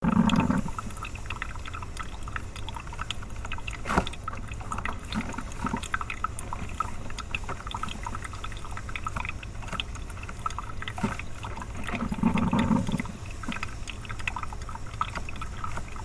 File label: brewing